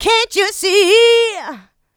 mixed vocals; Houseworx

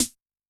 Ana Wi Rim, Yamaha DTXpress-II snare

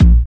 DR. LOOP, DRUMS; X-Static Goldmine 3

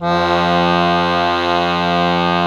BANDONEON F0; Best Service; Alpine Volksmusik

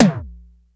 HI TOMTOM, D110 DRUMS; Crypton - DrumBase5000

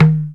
LINN TABLA; LINNDRUM9000; Greytsounds VOL-1

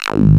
bass, 170 BPM, drum & bass; Ueberschall; Drum & Bass Flash Trackz

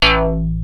PPG metal A1, PPG Wave 1; Greytsounds VOL-1

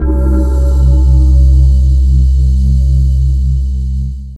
F# , Pads; Zero-G; Total Drum Bass

